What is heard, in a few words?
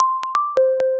wood gradient notificatio